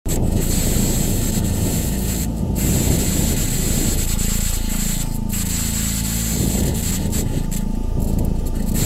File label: Martian wind